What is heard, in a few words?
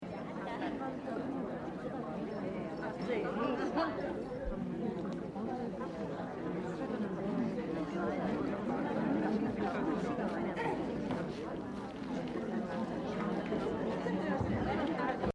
audience